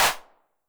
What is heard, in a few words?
HAND CLAP, 909 Reverb